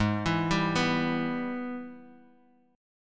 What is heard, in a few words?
Ab Minor Major 7th Sharp 5th, down, Guitar, Drop D; arpeggiated; JGuitar